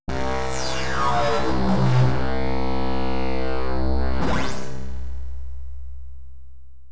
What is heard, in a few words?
Ringmod In The Hall; AMG - Prototype Drum & Bass